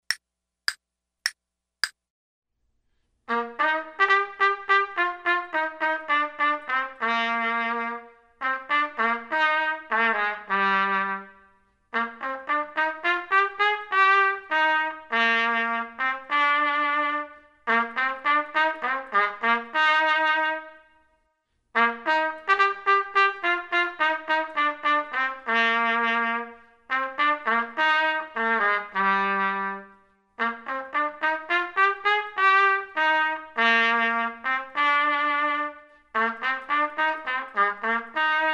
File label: trumpet; Selected Duets for Trumpet Podcast